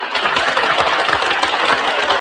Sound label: common applause